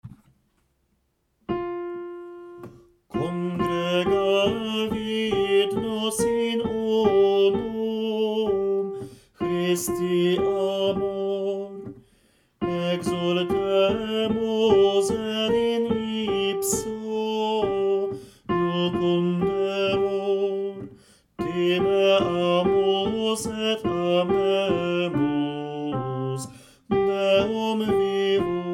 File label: Ubi caritas ZWROTKA